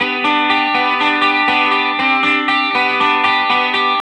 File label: BYRDY RIF 1 G, Vintage Blues Guitar, 120; USB Soundscan vol.22; AKAI